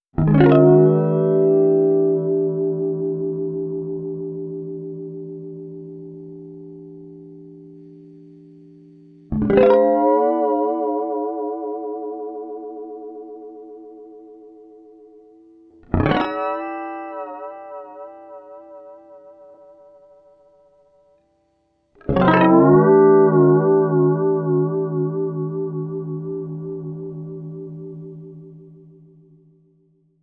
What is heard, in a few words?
Arming Guitar